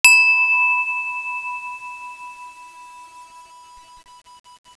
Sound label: chime